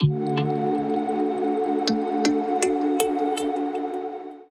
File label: jingle hope short; Survival Metroidvania Vol1